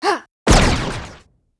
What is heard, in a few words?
Arcwright Attack